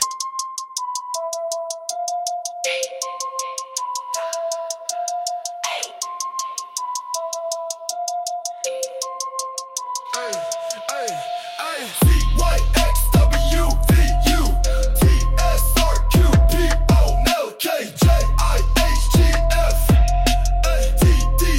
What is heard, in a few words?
Backwards ABCs Trap Mix